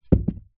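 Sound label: foley wood stick thick medium heavy drop carpet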